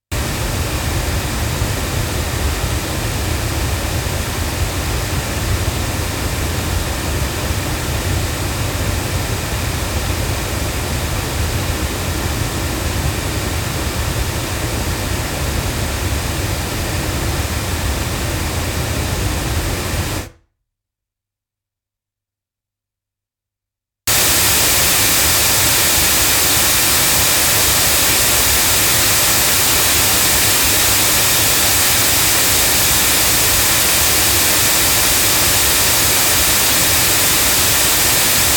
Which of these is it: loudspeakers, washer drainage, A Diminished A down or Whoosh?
loudspeakers